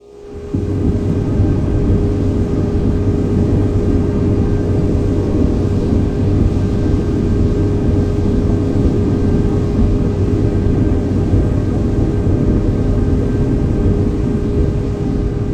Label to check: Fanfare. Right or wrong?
Wrong